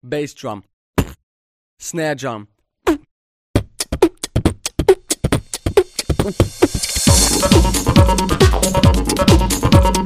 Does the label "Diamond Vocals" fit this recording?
Yes